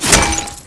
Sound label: helmet hit